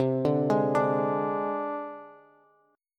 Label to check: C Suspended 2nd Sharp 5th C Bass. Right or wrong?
Right